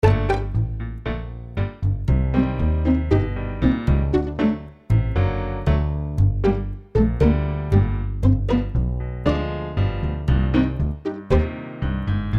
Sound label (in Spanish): Tango Argentin; AiR Symphony